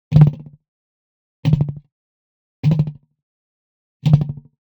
Generic Click Digital

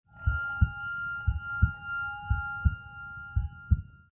Crystal Pyramid; Blind Quest - The Frost Demon

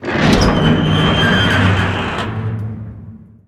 Door Large Metal Open; SFX Kit; Doors

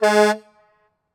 Semi Truck Horn Honk; Large Vehicles; Blastwave

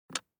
DICE on Glass, Grab, Standard, 3 Three Dice; Samples; ArticulatedSounds; DICE Sfx Party (Extended)

